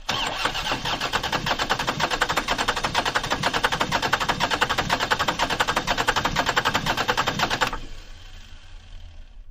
Car; False Starts, Short, Medium And Long Attempts With Starter Burns